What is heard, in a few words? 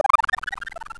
heal